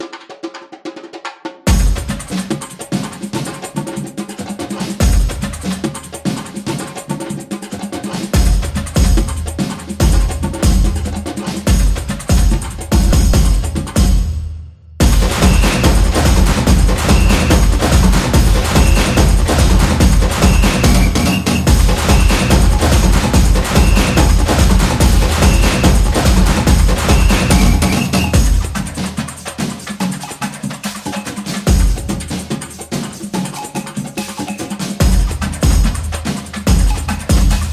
Samba Drums; tribal dance

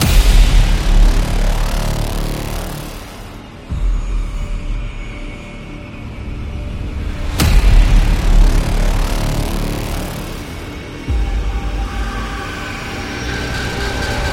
Horror Teaser

